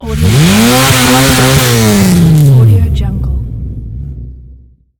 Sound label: rally car high revving